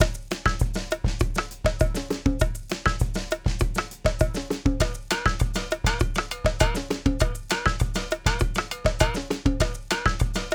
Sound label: Samba